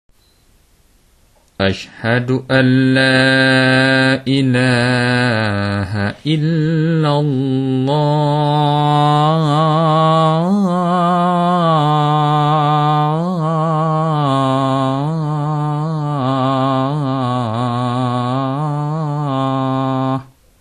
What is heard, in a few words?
Adzan